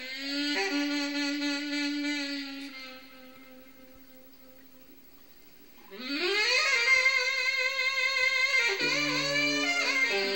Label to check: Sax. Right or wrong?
Right